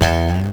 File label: Bass Slide; Zero-G; Soundclash; Acidized Wavs